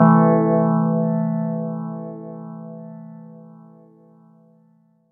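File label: Am chord; Flowerz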